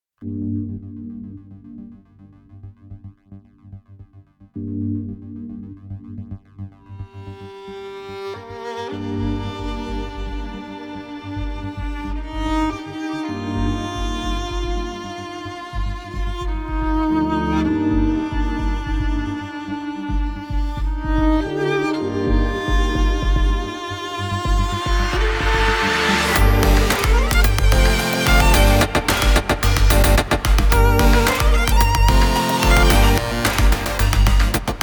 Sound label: Two Sides Main Full 110BPM